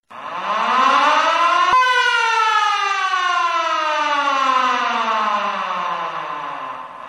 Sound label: sms alert; ringtones